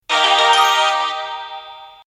Level Up; TsumTsum

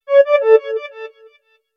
sms sound

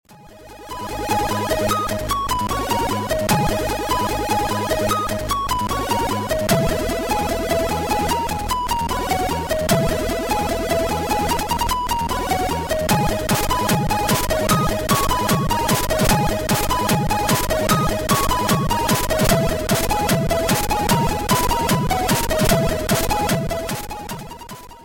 Chipmusic

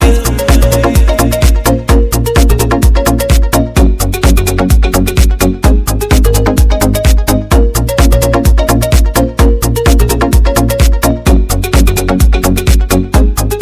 Beat

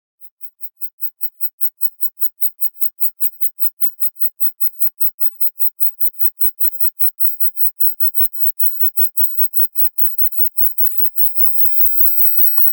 Anti-mosquito

